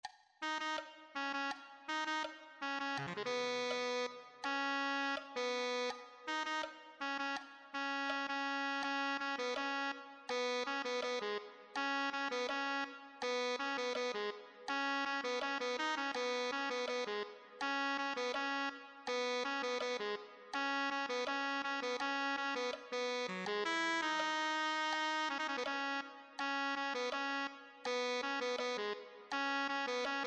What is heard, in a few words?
tenor saxophone